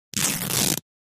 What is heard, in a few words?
Fabric Tear Rip